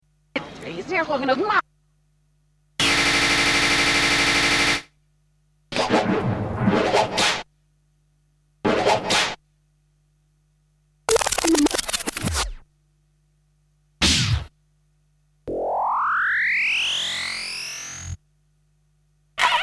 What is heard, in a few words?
Sound Effects; Kniteforce; 1000 Samples Volume 1